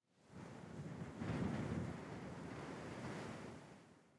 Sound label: wind gust; factorio